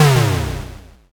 explosion s

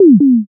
poyon

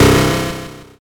zap

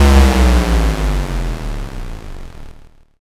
explosion l

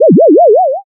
teleport

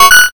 notify

